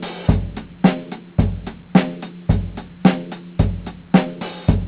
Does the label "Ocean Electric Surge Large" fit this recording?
No